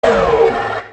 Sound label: minigun Off